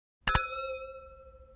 Double Glass Ding Crystal Champagne Flute Toast, Household